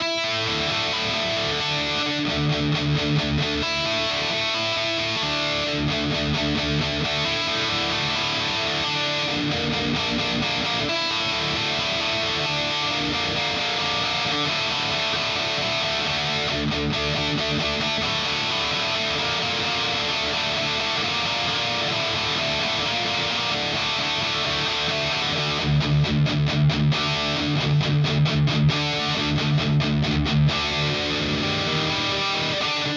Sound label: Harley Benton DNAfx GiT Amp Rockerverb